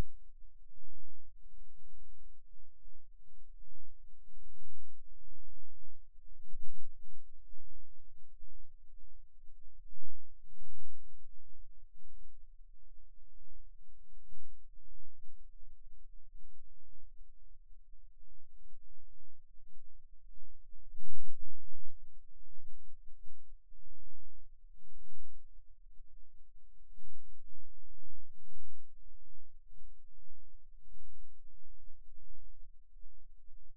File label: Rhythm Wave, eeg seizures